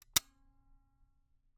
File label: Camera, Kodak Extramax, pocket, 110 film, plastic, metal, snapshot; Eiravaein Works; Vaeyan IV